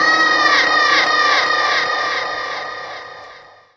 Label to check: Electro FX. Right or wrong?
Right